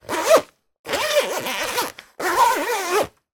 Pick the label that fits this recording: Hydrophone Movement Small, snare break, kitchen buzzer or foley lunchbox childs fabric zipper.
foley lunchbox childs fabric zipper